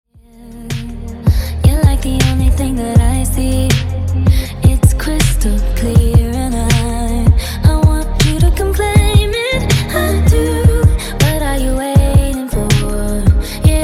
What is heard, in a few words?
Funk 80 Bpm